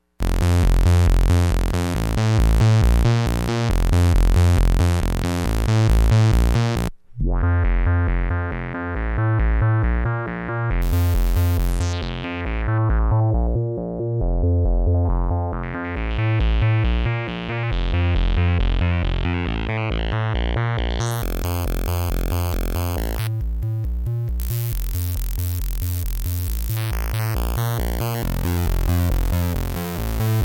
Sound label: bass seq, synths